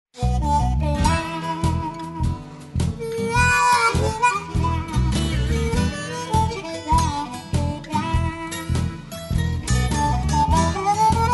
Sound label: Blues; Atirei o pau no gato